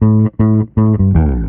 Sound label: bass be on high alert, Loops, Phonky